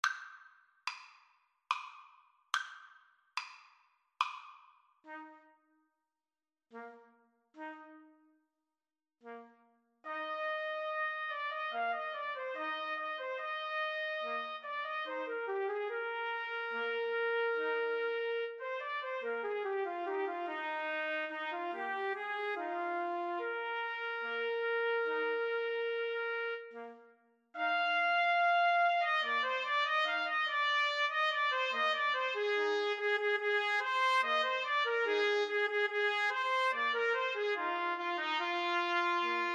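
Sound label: bolero trumpet, karaoke; Trumpet Trio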